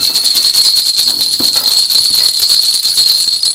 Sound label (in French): grelots